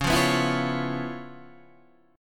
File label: C sharp 7th Sharp 9th, chord; C#7#9 Chord {9 8 9 9 6 9} strummed